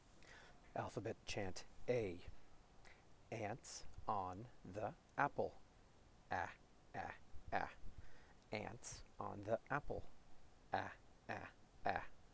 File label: alphabet chant 'a' slow